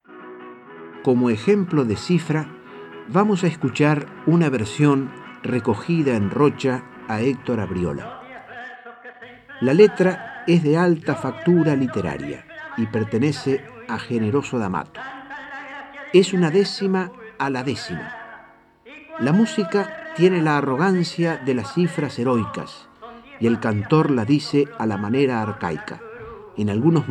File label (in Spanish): cifra